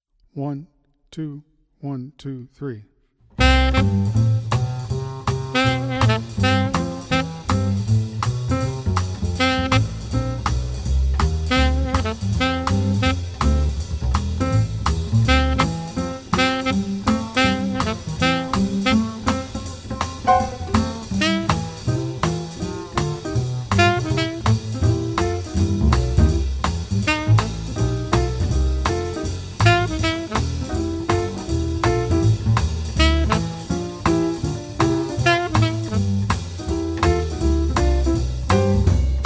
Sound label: rhythmic audio sample